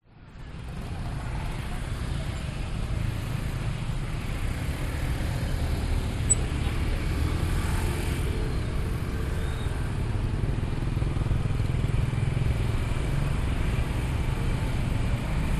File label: traffic motorcycles indonesia ubud palace main intersection 7am